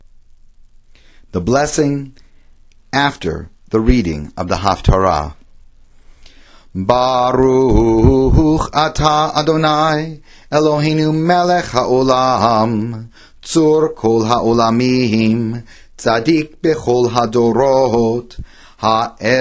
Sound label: blessing after haftarah chanted